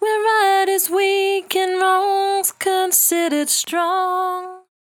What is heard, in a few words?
68bpm D, Velvet Vocal; Zero-G